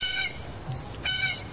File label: blue jay; birds